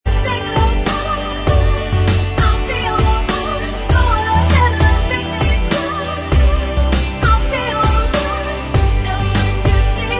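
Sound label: instrumental, track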